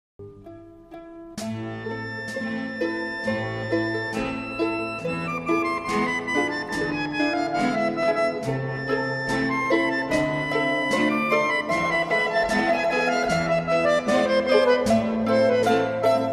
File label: Klezmer